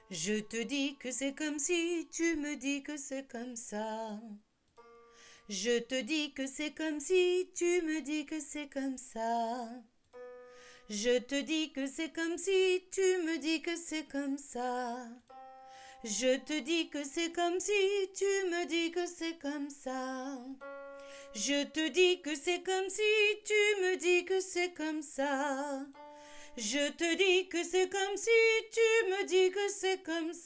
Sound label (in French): vocalises